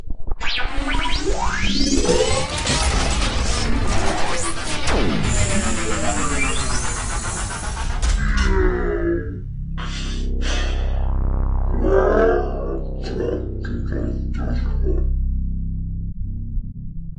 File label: Transformers Sounds